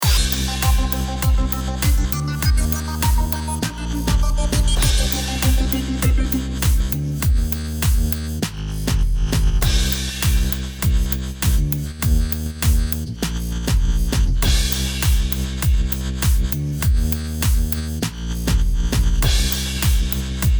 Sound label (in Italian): danza